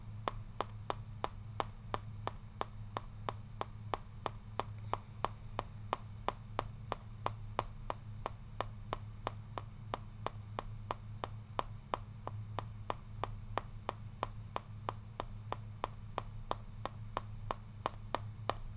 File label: Lego Walking